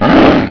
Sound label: Yeti Growl; Playable Tutorial Level; TR2